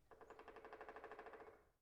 woodpecker pecking fast

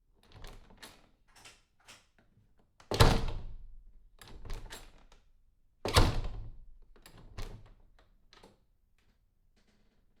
door close and lock

